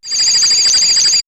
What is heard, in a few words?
Chainery, synth fx; StarWars; X-Static Goldmine